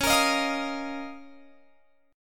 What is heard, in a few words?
C sharp 6th Add 9th, Guitar, Open F; strummed